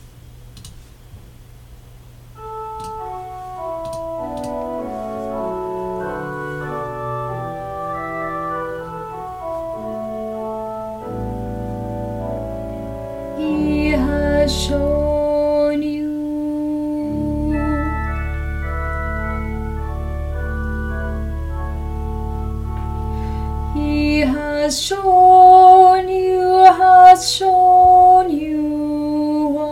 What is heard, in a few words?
walk humbly organ and alto